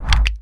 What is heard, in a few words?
block sound; build mode